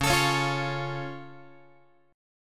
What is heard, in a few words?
C sharp Augmented down Guitar, chord; x,9,12,11,9,12; strummed; JGuitar